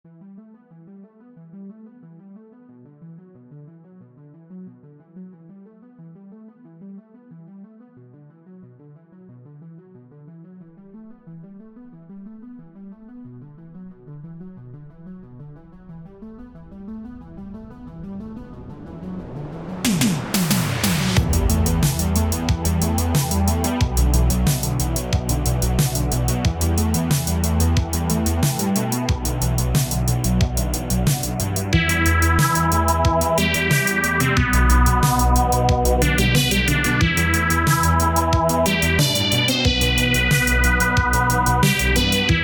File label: Synthwave